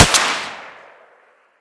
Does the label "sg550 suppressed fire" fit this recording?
Yes